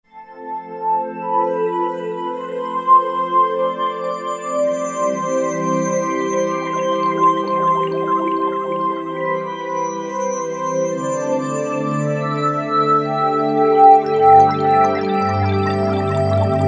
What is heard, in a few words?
Roland JV-2080; December Sky